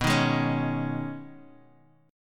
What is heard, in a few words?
B 6th Add 9th B, chord; strummed